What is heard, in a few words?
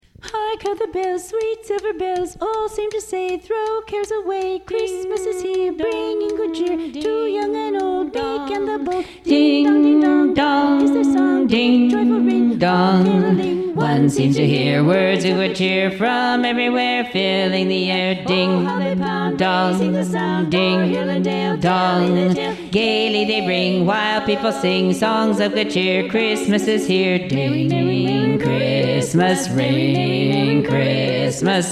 carol bells bass